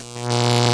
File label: spark; vendetta